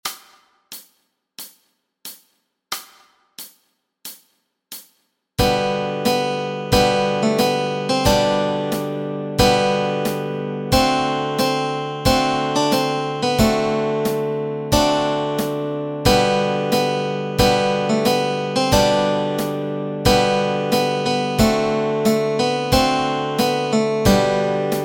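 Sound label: melody and chords, Easy Guitar and Ukulele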